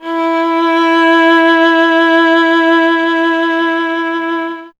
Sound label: viola E; Miroslav Vitous; Solo Instruments VOL-1